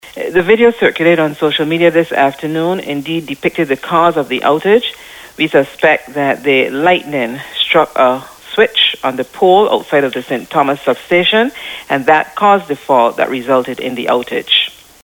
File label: spark